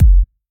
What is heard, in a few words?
heartbeat; seele 【azer】